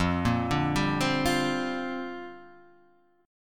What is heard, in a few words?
Bb 6th Add 9th F